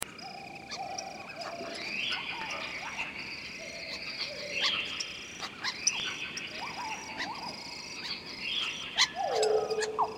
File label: forest; Star Wars Battlefront; Endor; endorForest